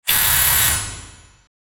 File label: air wrench